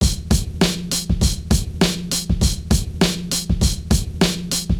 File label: drum Loop